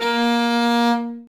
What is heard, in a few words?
nyckelharpa A#3; SoundBytes - Early Patches